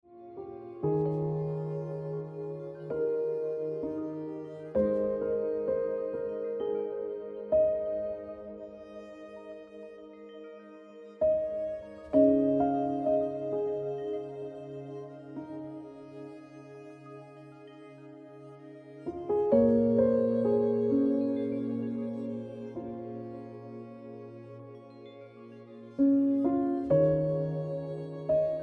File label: Piano Music